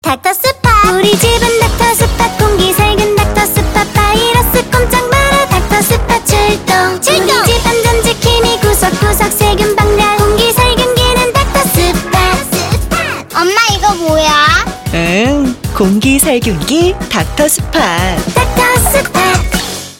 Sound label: BGM; 닥터스팟